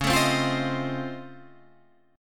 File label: C sharp Augmented Major 9th down, guitar chord